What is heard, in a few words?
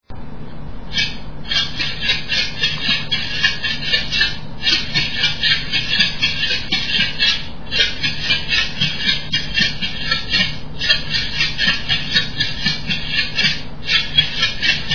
axatse; Etnologia